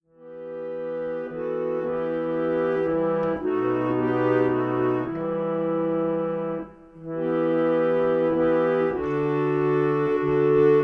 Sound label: reed organ hymns